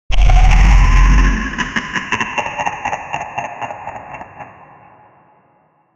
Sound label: giant robot laughter sound; artificial intelligence